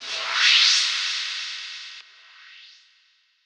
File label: Swept Noise